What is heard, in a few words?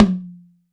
TOM B OP